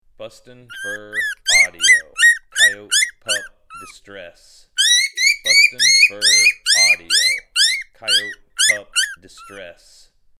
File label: Coyote Pup Distress